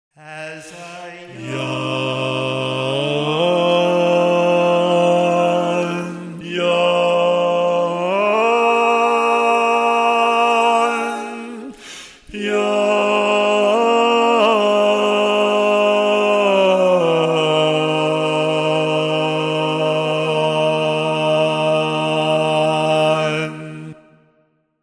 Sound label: Bass, barbershop